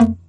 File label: login failed